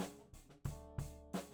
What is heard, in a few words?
Floor